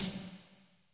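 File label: wet snare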